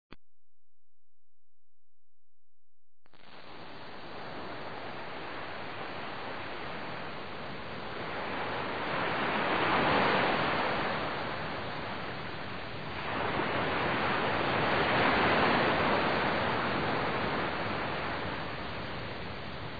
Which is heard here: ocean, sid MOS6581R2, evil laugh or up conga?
ocean